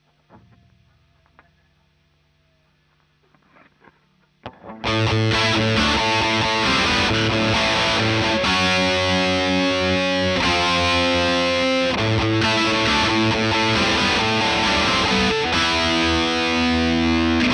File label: Marshal Gtr